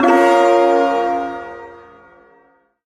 Shawzin Hirajoshi Chord; Hirajoshi Chord E; Warframe; Duviri Ersatz Shawzin; Chords

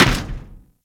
Moa step default; Warframe; Footsteps

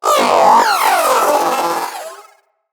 Zanuka Companion Vocal Death; Pets

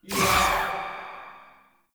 Choir Erupt Proj Throw

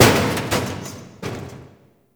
Destruction Small Metal Hits; Ambience; Warframe; Corpus Railjack

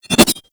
Tonfa Parry Whoosh; Warframe; Tenno

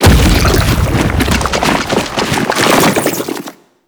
Octopede Lash Limbs Slam, Enemies; Warframe; ManInTheWall

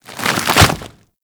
Golem Gun Wings Open; Foley

